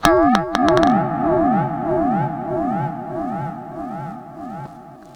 SPACEY L, Funk Guitar; Zero G - Funk Guitar

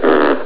pig; Amiga Soundtracker sample

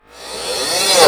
REVERSCYM, CYMBALS; USB Soundscan vol.20 - Fresh Disco House I

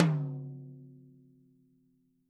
T10 MF L, TOMS; Living Drums; Sampleheads; Peter Erskine; PB KICK+TOMS

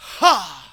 VOX SHORTS; Megabass Remix! 2

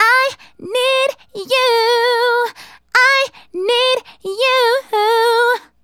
Female Solo, Vocal; Sonic Foundry (Sony Creative Software); Vocal Toolbox

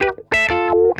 ROLLER, Funk Guitar; Zero G - Funk Guitar

